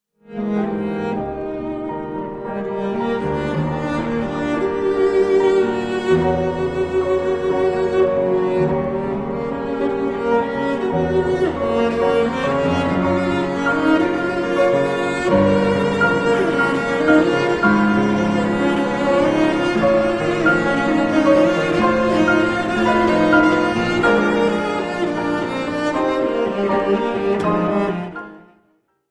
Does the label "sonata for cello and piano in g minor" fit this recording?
Yes